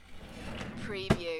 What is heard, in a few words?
desk drawer close